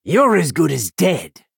attack; acolyte of boethiah

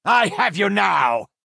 attack; banus alor

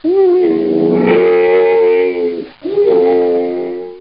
Mummy

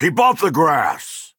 attack; gardener of swords

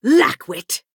attack; uupse fyr

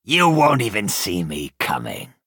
attack; helstrom footpad

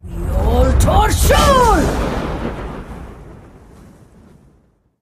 Fire breath

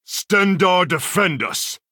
attack; rising legate

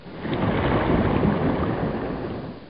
Slaughterfish

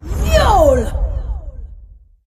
Fire breath

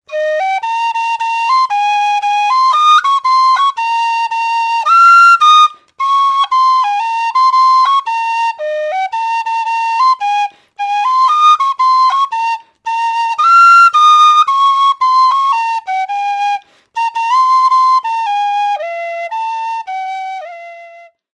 Flute Ascents

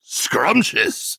alt attack; grisly gourmet

